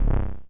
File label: Tuba Bah; Free Hearts; Gambling